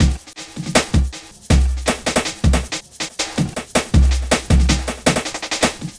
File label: Beat, Futurist Drum & Bass; Drums & Percussion